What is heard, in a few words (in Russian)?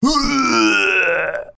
Звук рвоты человека; на уведомление, русские, на смс